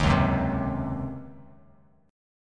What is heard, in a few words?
C sharp Major 7th Suspended 2nd Suspended 4th Bass Standard; C#M7sus2sus4 strummed; 11,4,4,5; JGuitar